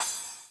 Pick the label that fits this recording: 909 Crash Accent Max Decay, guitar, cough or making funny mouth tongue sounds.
909 Crash Accent Max Decay